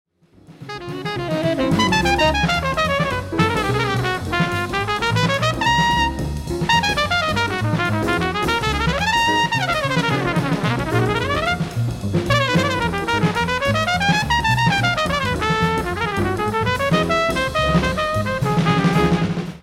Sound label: Trumpet Solo